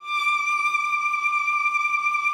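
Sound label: D2, cello vibrato; Xsample VOL-7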